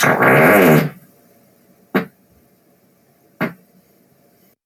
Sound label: loud stinky farts